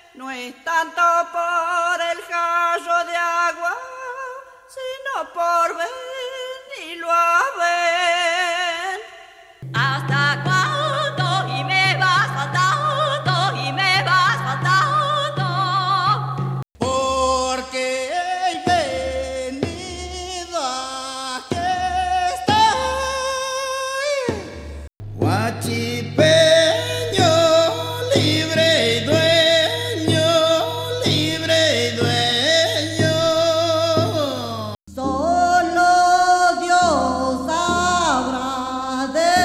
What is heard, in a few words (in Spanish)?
Falsete